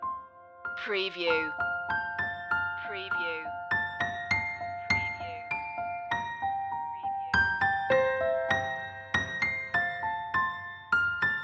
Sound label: piano loop end; away in a manger; media music now